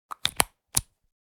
Speaker connector adapter plug in; headphones and speakers

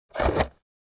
Skateboard picking up from concrete

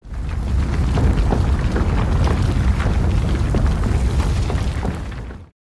Rocks falling from mountain

